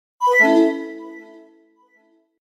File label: new message